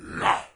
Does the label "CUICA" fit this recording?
No